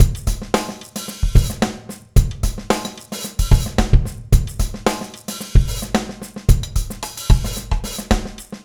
Beat, Drums, Eclectic Electronica

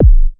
Kick, Ensoniq ASR-X Pro

